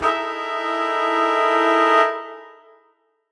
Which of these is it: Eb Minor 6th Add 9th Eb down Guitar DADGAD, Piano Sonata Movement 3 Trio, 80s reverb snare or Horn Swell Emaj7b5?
Horn Swell Emaj7b5